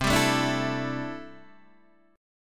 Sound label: C 6th Add 9th C down Guitar DADGAD